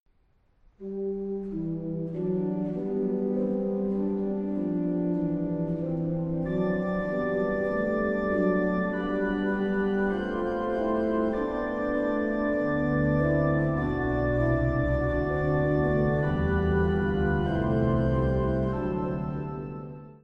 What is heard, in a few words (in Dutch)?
Orgelvers